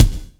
KICK, GATE KICK

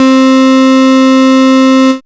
syn c, Dance Synths, Wasps; Best Service ProSamples vol.37 - Dance Synths

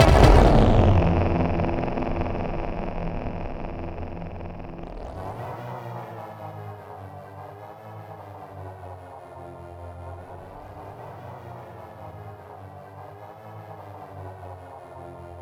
C3, SynthLine; 90 s Sample CDs; NiceBeats; SynthLine VOL-7

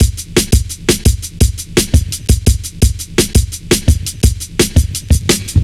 steamboat, Drum Loops, Drum&Bass, 170; Total Drum&Bass

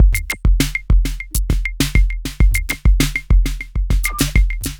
Smooth, R&B Drums

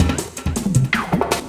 Loop, Drum & Bass, 160 BPM

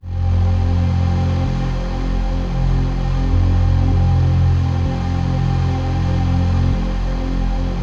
CHRD PAD; Best Service; XX Large Pads; Sample CDs

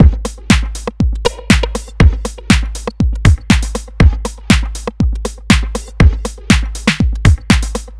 Vox Techno Beat, Drum Loop; Sonic Foundry; The Electronic Music Manuscript Collection; Drum Loop Structures

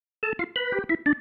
Single Riff, Organ

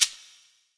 Maraca, percussion reverb; MARKYDRUMS

